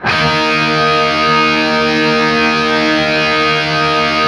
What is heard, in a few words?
5TH D# looped, Guitar; East West; Steve Stevens Guitar Samples Collection